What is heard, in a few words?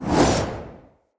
Long Sword Whoosh Heavy; Weapons; Mother